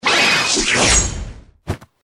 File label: attack; hero sound